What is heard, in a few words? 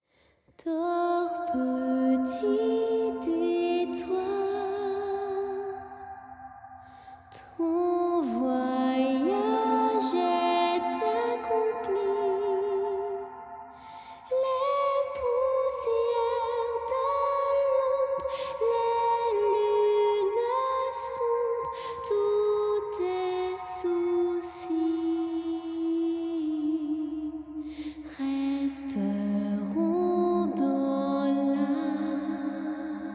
Lullaby Acapella; edge of eternity